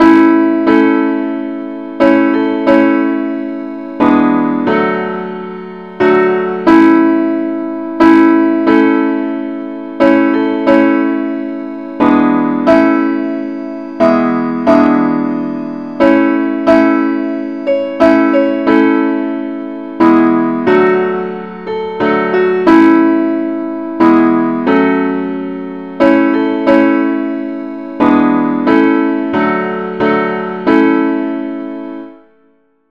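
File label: simple accompaniment key A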